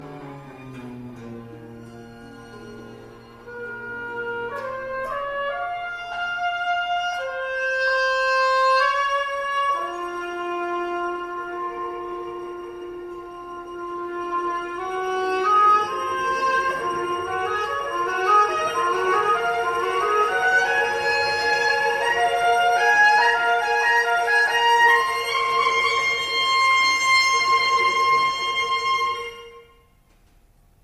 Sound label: Oboe interlude; Utrecht